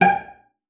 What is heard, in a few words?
CELLO FINGER; 90 s Sample CDs; Xsample VOL-5